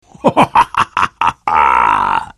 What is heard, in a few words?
lion laugh